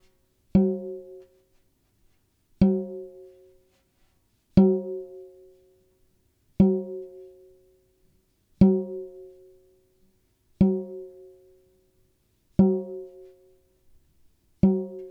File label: Em120 Flavourtones DRUM2 dry